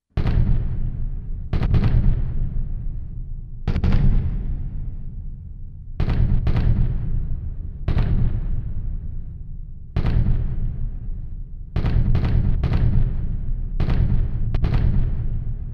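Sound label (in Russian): множественные взрывы вдалеке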